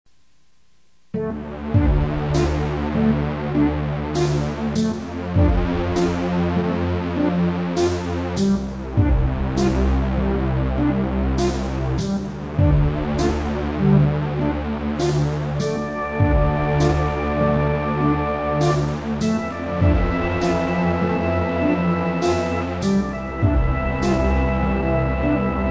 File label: Organs